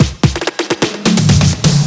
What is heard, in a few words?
Fills 128BPM, Clubsounds